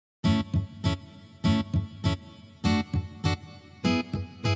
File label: Ska Slowed Down